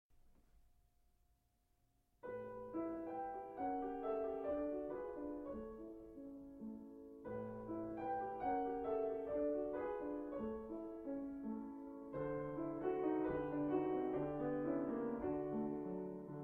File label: Piano; Schumann Kinderszenen Op 15 No 4